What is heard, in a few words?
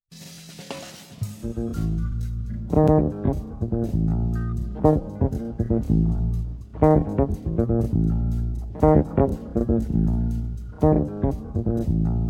Vampira B♭ minor bpm 60; Tempo ralenti